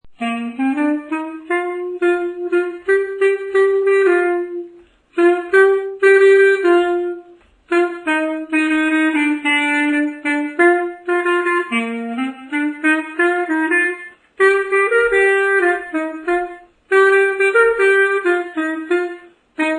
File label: xaphoon; alle meine entchen; Blasinstrumente